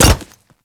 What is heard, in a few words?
Pet Step Default; Alad V